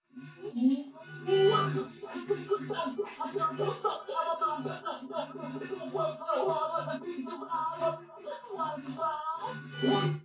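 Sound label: with drums, 380ms 4cm; SiSEC11; underdetermined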